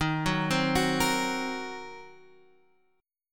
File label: Eb Minor 6th, Guitar, Ebm6 chord; arpeggiated